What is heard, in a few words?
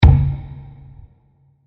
Direct Wood Impact With Reverb; Blasts; Impacts; Crafted Signals